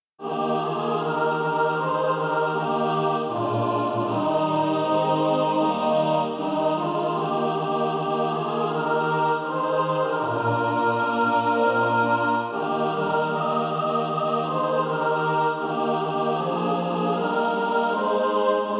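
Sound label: mixed, Practise Music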